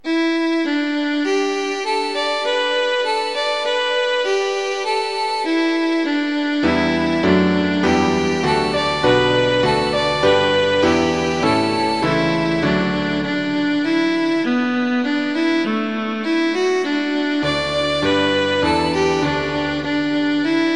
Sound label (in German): violine u klavier; promenade